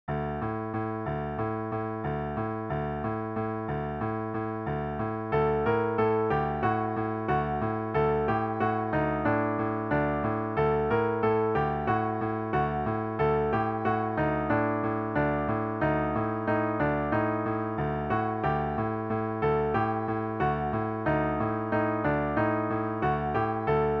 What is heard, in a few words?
egyptian dance piano